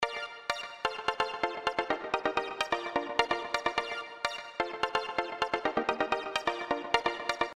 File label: BackBeat